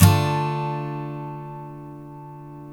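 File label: steel D minor down, CHORDS STEEL, Guitars; Spectrasonics; Hans Zimmer Guitars VOL-1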